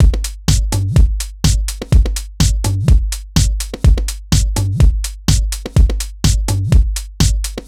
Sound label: Full Loop 125, Loungin House; Full Loops 125; Equipped Music